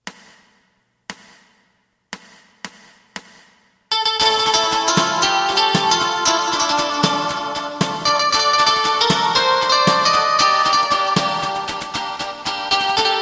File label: bass